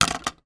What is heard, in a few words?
household sunglasses plastic down on wood table; zapsplat